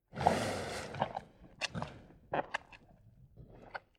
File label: Nature Animal Eating Beef Bull Heavy Breathing And Chewing Grinding Teeth Monster